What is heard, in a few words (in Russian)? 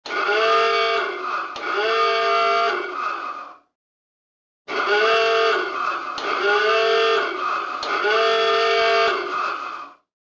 Signal trevogi na podvodke